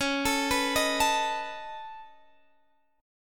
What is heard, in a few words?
Db 7th Suspended 2nd Sharp 5th Db down Guitar Half Step Down